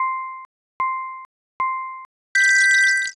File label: cd soft